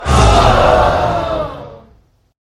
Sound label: death slam miss; lash; lash death slam crowd hype